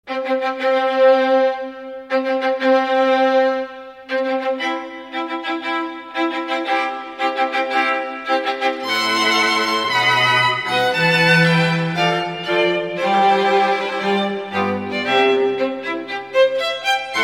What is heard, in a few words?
Wedding March